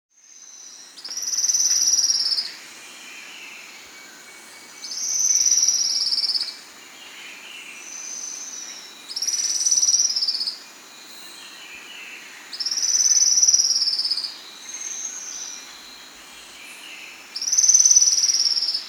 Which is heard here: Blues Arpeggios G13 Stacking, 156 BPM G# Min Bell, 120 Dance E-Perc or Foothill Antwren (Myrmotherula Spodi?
Foothill Antwren (Myrmotherula Spodi